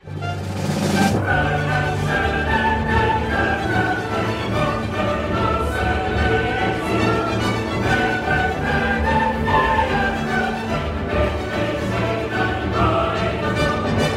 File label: ode to joy; peggle